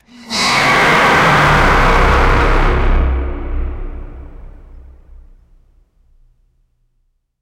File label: velociraptor roar